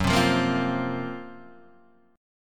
Gb Major 7th Flat 5th, down, Guitar, Half Step Down